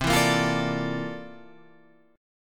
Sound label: C 13th C down Guitar Half Step Down